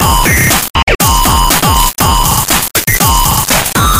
Super Crunch Beat

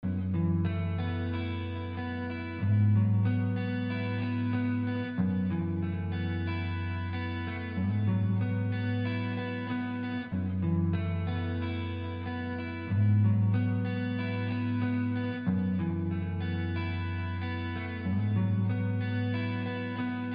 Guitar Melody

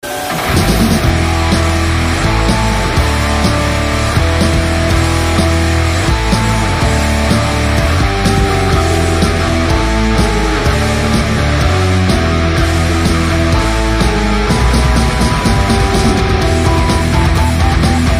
metal; futurama

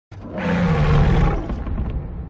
grunt; Grimlock

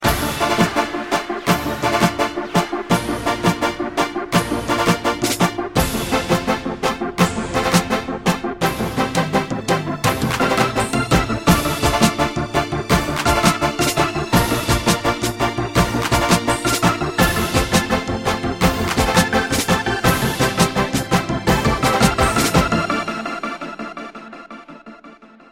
Haitian Beat

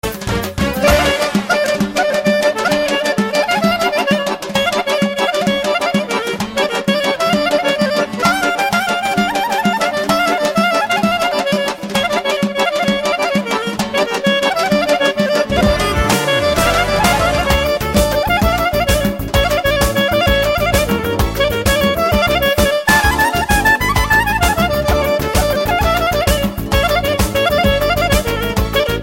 Clarinet Dance

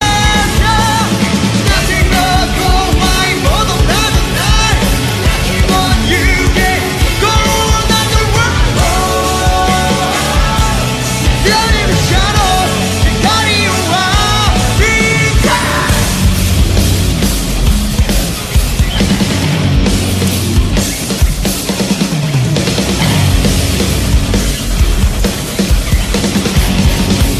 Rock

